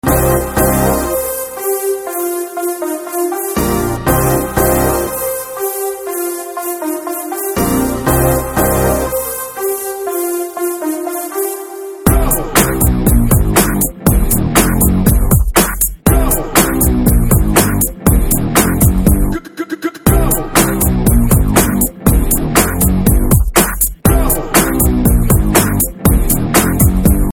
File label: Theme Ringtone